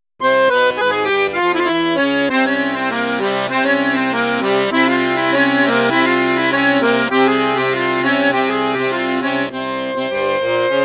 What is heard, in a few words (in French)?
registre basson flute juste flute haute, accordéon; cavagnolo professionnel 12